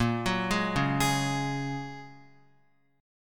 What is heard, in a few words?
Bb Minor 7th Sharp 5th, Guitar